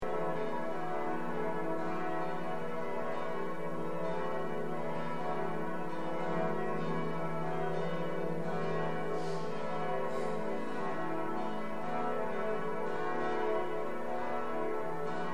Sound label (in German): Glocken läuten am Palmsonntag